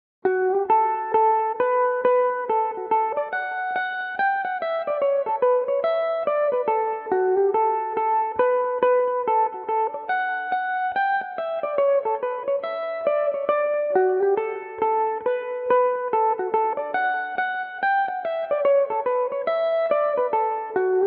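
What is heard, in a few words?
barndance D major belmuse, irish mandolin; kerry mills barndance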